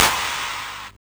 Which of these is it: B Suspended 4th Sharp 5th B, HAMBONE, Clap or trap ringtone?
Clap